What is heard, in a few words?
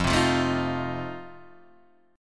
F 7th F down Guitar Standard; F7 strummed